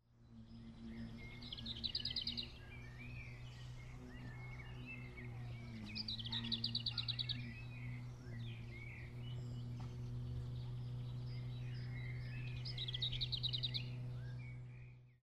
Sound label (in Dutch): Braamsluiper met grasmaaier